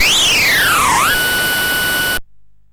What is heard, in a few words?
Fingernails on a Blackboard, CSG8580; CSG8580R5